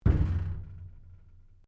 Strike Metal Tank Exterior Rattle Soft

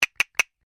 Castinets triple, simple wooden persussion toys; Musical

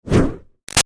Fire Torch Whoosh Fast Short; Flames; Objects; Big Room Sound

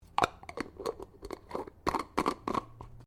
JAR GLASS LID PLASTIC SCREW ON; Various kitchen jars; Twists (Sound Effects:Objects)

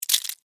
Insect Claw Wet, Juicy, Snap, Movement, Bug; Animal

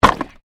Wood Movement Medium

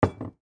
Coffee Cup Set Down Counter Top; Dishware; Household; Blastwave

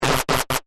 Hit Three Square, Waves

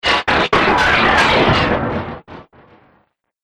Science Fiction Laser Glitched; Laser Sound

